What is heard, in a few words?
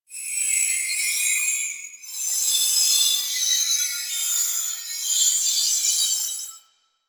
Drifter Camp Valley Night Critter; Ambience; One Offs; Warframe; Quests; The New War